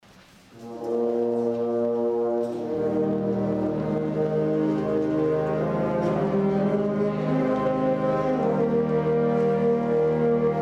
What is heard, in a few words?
Shenandoah Wind Ensemble; 2012 Spring; Mid Illini Concert Festival; LCHS Wind Ensemble